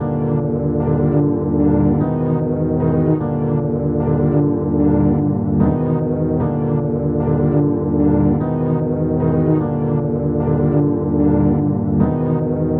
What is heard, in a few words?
Synth Melody, Cinematic Funk; Sonic Foundry (Sony Creative Software); Cinematic Funk Urban Tone Poems